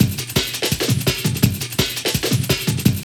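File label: drum loop; X-Static Goldmine 3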